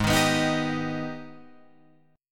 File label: Ab Minor Major 7th, down, Guitar, Open F; AbmM7; strummed